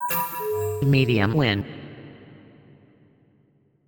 slot win medium

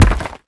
symbol Landed

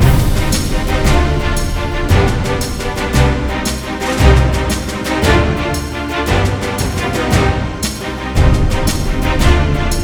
free spin main loop; netent

